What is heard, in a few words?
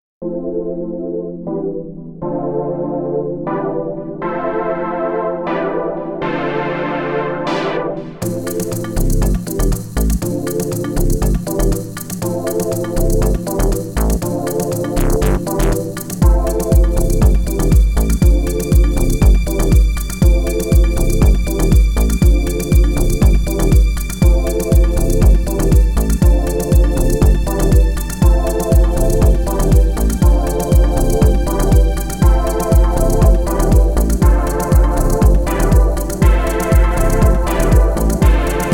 groove